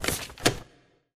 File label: tile flip; newsroom